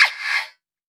Vocal Oneshots; Vengeance Samples; Vocals

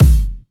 127BPM Kick; Vengeance Samples; Com Pop Kit

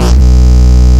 Root B, Ultimate Bass; Vengeance Samples

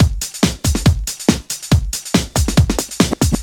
Breakbeat; Vengeance Samples; VEC2 Loops

